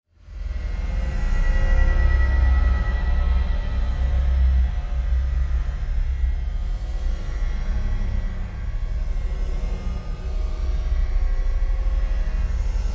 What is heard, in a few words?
Bed Digital Shimmers